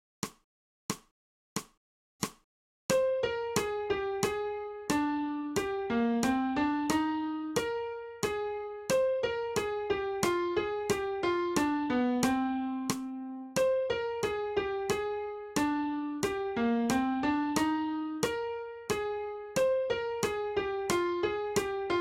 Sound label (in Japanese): 新フレットレス